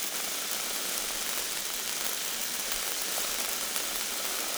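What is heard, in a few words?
plasma projectile, plasma grenade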